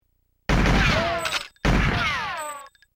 switch reloading; goldeneye 007 switch

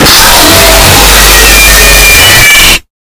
jumpscare sound; the lcs nightmares

